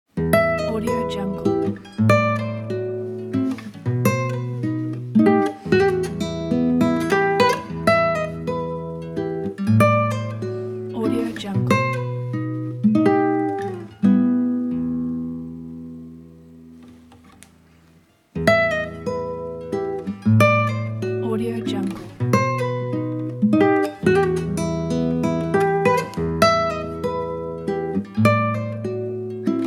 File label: emotional classical guitar; Francisco Tarrega Adelita